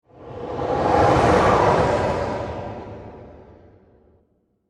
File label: Science Fiction Spaceship Pass By Whoosh Flange; Spacecraft Sound Effects; SFX Bible 6